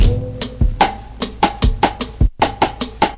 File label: quirky rim riddim, drum loops and breaks, 075 bpm; 000 to 080 bpm